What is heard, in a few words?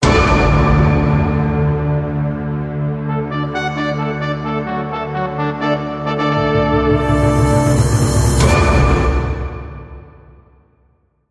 fanfare